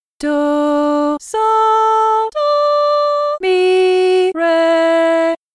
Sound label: Hard Melody Solfa